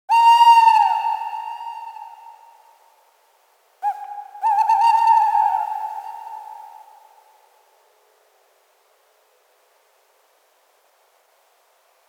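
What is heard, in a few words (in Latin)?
Strix aluco hlas samca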